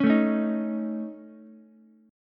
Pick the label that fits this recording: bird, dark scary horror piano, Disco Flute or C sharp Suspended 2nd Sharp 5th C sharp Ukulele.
C sharp Suspended 2nd Sharp 5th C sharp Ukulele